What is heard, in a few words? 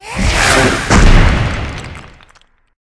arch lich attack, monster